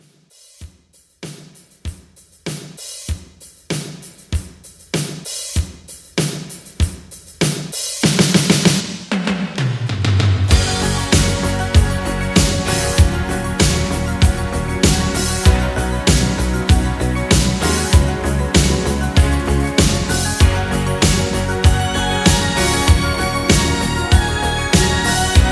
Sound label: 80s Pop Re Drum 97